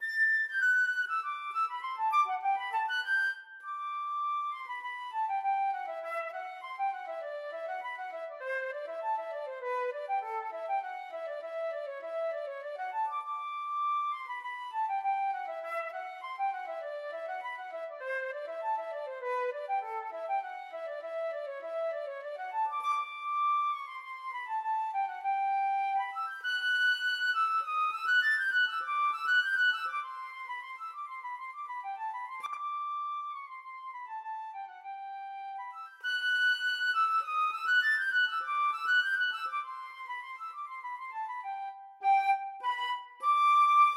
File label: flute